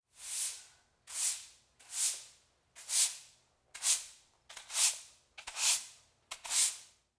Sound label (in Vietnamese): Am thanh tieng quet choi xao xac